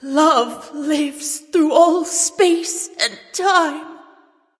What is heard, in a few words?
death; smite; aphrodite